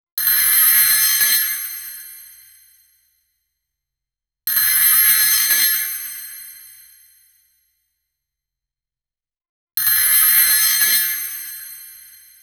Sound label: movie jingle sound